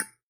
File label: Bone Impact